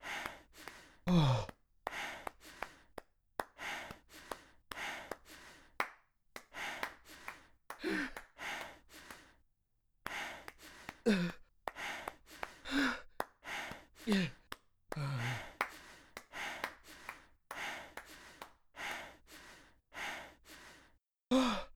Fapping; Lucius III

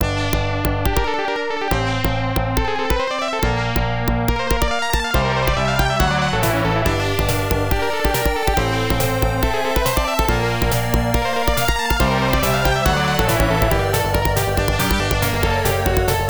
Alert!!; Dyna Brothers; Genesis

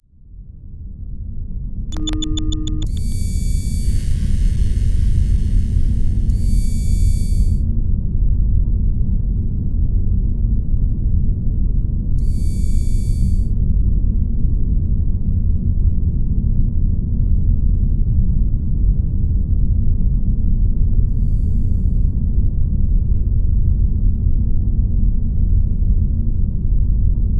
Machine Start Hollow Sounds And Servos; Lucius III

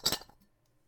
hit can

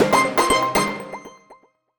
Jingle New Lap; Garfield Kart Furious Racing

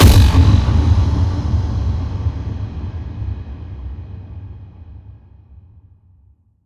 Wave Start (Inst); Killing Floor 2

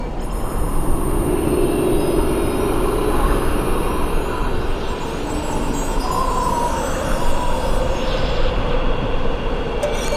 (Ambient) The Mountain Wind; rayman 3 hoodlum havoc gc ps2 windows xbox 2003

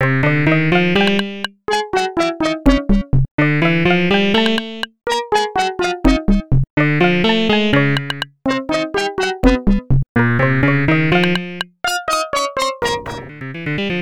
Sneaky Trumpets; tick; genesis

